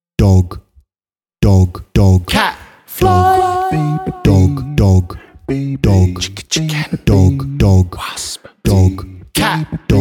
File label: Dog Cat